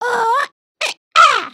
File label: robot dance, emotes